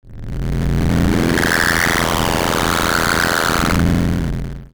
Science Fiction Sci-Fi Electronic Heavy Distortion